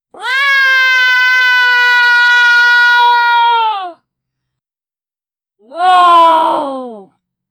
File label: a short sharp shriek, fear; a short sharp shriek yaaa